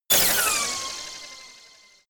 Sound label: laser sniper shot; soundpool